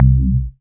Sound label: BAS TX-802; Best Service Gigapack 2